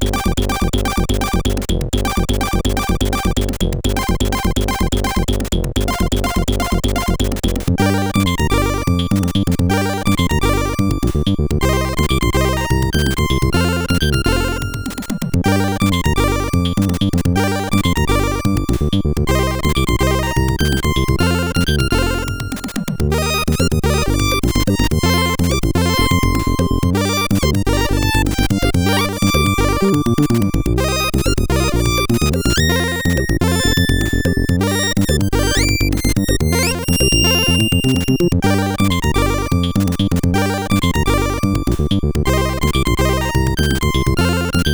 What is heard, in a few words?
Break 64, sid CSG8580R5